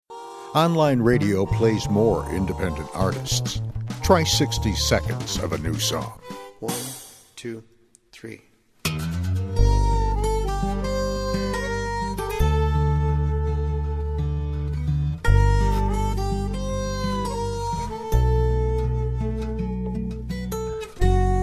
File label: pop